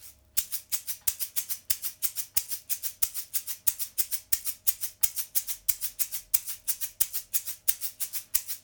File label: shaker